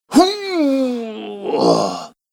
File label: Weightlifter Male Strength, recorded voice